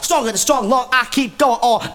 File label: VOX SUNG; Megabass Remix! 2